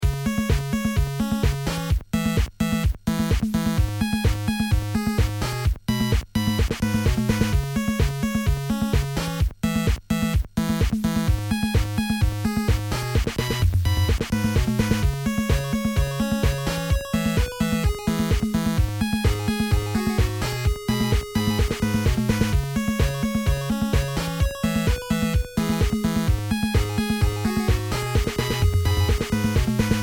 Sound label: beat 96